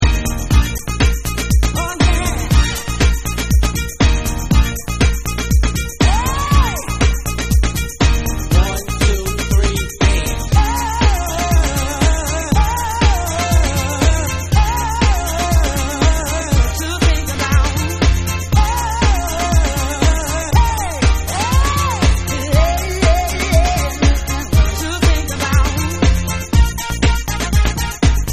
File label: Hip House Uk Remix